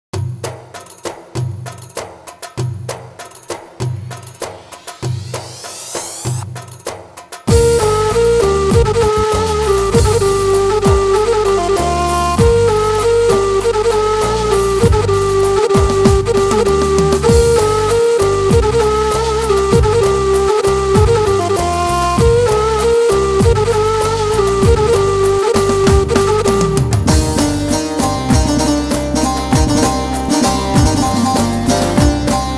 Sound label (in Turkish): a2000 baglama; saz